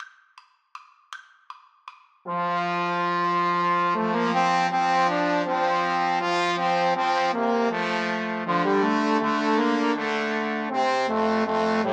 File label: Trombone Trio